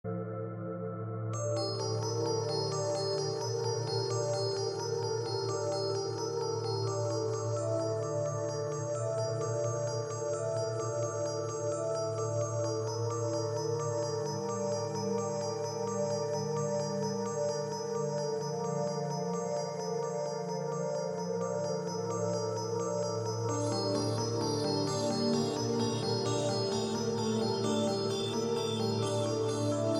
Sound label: game ringtone; DEATH STRANDING